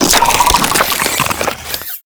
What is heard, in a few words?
Infested Weakpoint Spawn; Warframe